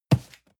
cardboard box medium grab; objekte

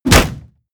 hit shield wood; hits impacts

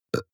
burp very short; koerpergeraeusche; menschen; soundpool

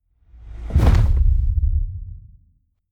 implosion fold up, whooshes; whooshes rises

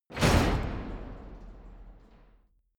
metal long; industrie wirtschaft

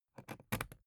briefcase plastic latch lock key insert; industrie wirtschaft; bueroarbeit

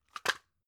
drug package drop; arzt

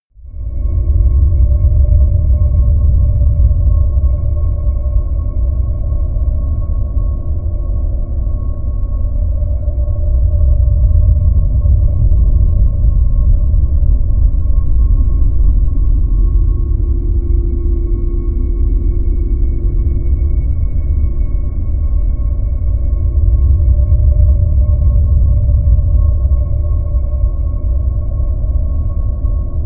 drone ghost

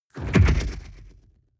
sci-fi wobble explosion

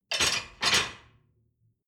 dishwasher plate place

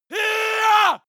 male attack aggressive scream; schreie; menschen

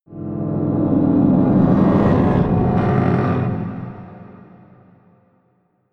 scrapes scary dark, drones

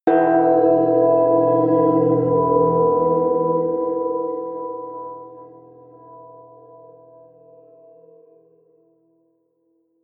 melodic odd sweet bell; flaechen

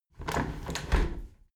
window handle squeak turn close regular; objekte; fenster

